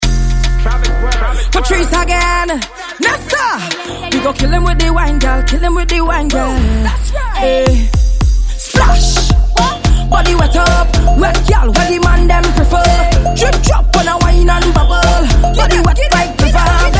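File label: splash, soca grooves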